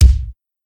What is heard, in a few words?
old school wet kick single hit C key, kick drum single hit